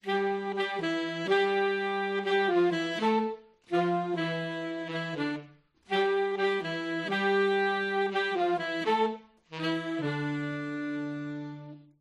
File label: Saxes E